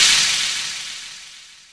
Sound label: NOIS WAV, INST WAVES